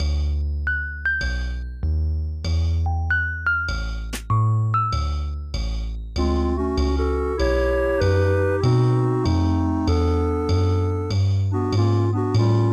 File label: Swing (Aircheck, Retro)